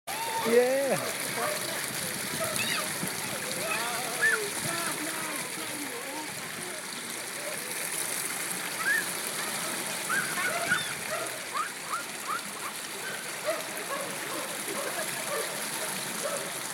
ambient sounds of park; Sutton Park

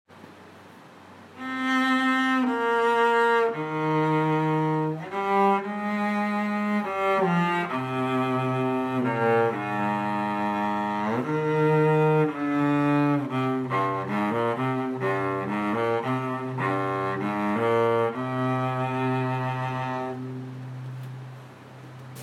riff

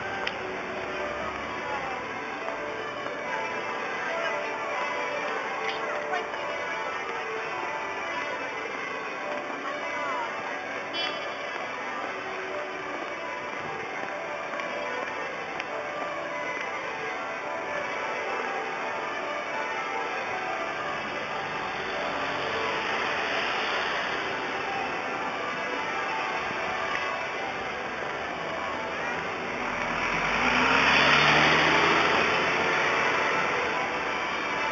st martins bells ringing as walk through london

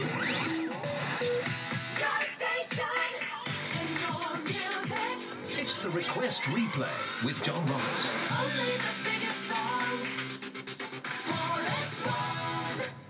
radio forest jingle

